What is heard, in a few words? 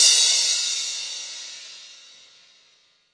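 classic hip hop low crash; STYLE KITS (Hip Hop, Etc); Classic Hip Hop Mega Kit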